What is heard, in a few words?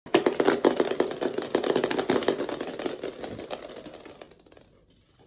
plastic ball